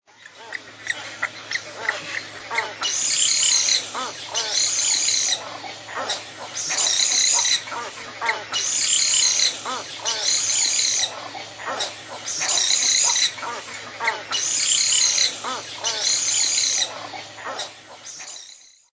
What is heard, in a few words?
White Ibis